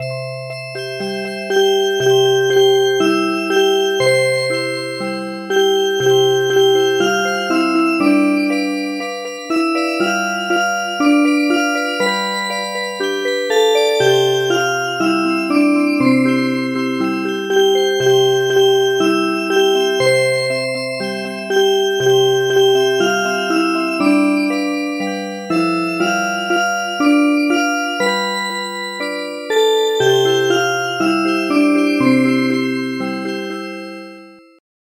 Music Box; Clap hands with the ladybugs

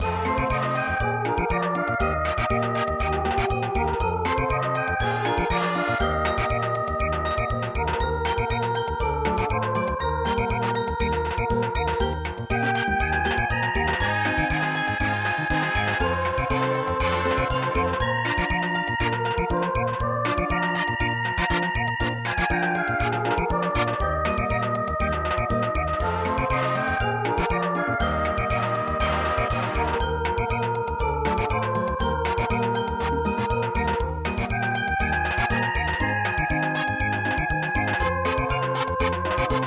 Samba; E Mama E

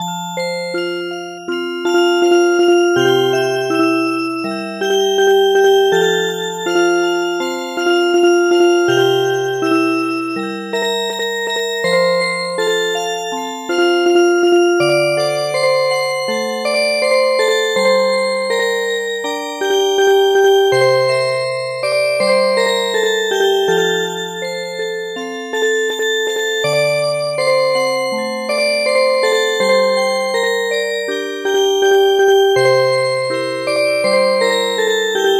Music Box

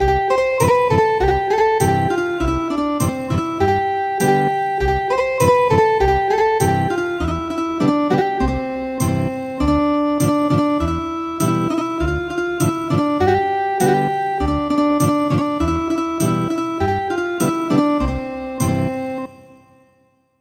Ethnic Guitar; Two Roosters

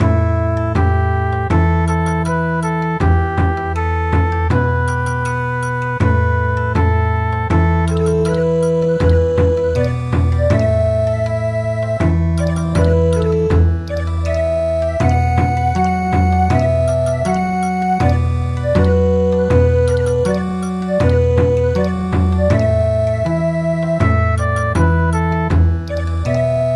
Bon Odori; Choshi Tairyou Bushi

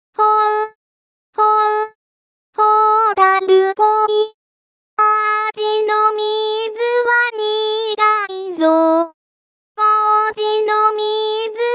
vocal

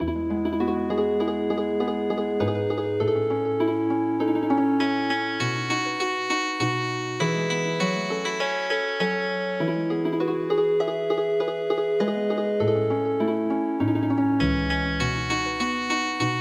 Healing Harp; Charubaita

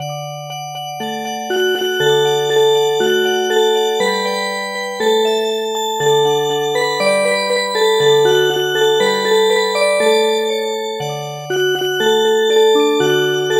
Music Box

